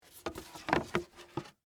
Game Open Board, Set, Down; Sports, Board, Game; Monopoly

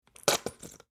Food Ketchup Bottle Squirt Empty; Household

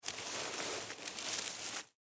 Rope Pick Up, Foley Rope Pick Up Dirt; Rodeos

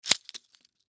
Keychain Mechanical Retractable Retract; Keychain Mechanical Retractable Retract Fast Drop; Machinery; Foley

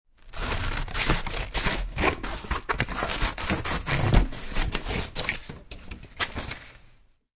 Scssrs Thck Ppr, Scissor cuts thick paper.; Scissors; Offices

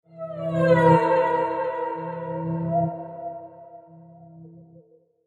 Haunted Space Energy; Ghost Sound Effects; science fiction sound effect

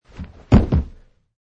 Landing; Man wearing jeans and hiking shoes jumping and landing on carpet surface - variation 24; Wrestling and Struggling; Michael Huang

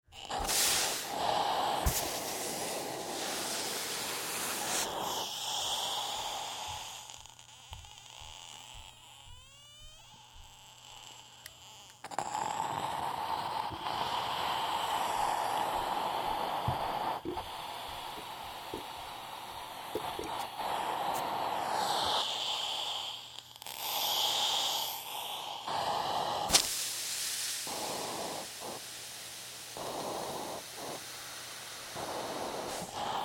Sizzle Iron Burning Water Steam; Hisses; Objects

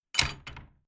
Household Old Farm House Metal Door Latch Closed; Creaky Door Sound Effects

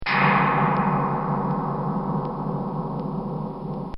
Chinese Gong; Brass; Musical; Bobby Cole

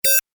Tech beep, Beep, High Tech; Tech Sound Effects; Tech (Sound Effects:Science Fiction); Stuart Duffield

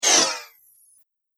Whoosh Swipe

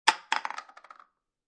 Foley, Aerosol Can Lid Drop On Table; Drops; Objects

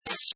Hover, Button sound; Multimedia

